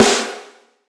big steel snare drum sound g sharp key

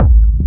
big fat techno kick span slam style; one shots; kick drum samples

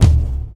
kick d key

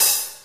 nineties snappy hat sound d sharp key; hat samples

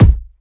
rich mid range bass drum single shot a key; kick

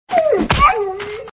rapid footsteps

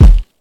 bass drum single shot d sharp key; kick drum samples

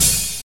80s studio open high hat sample d key

